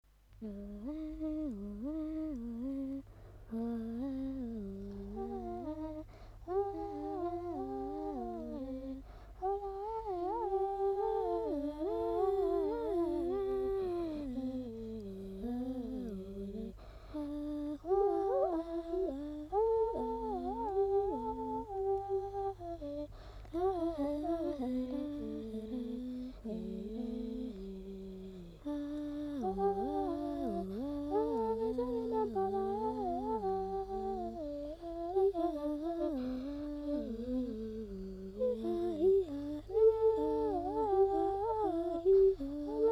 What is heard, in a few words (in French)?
duo pygmees